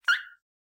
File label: Fart Whistle; SoundsCrate